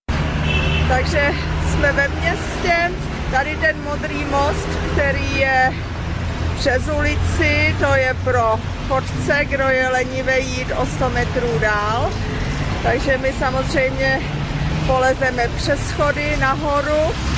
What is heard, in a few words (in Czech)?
trolejbus, Quito; Video Ekvador